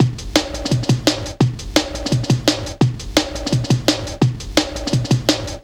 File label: LOOP, Jungle